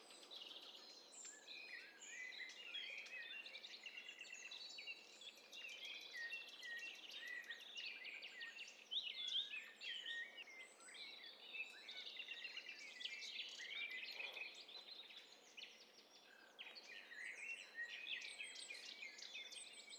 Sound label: STEREO BIRD COCKTAIL PARTY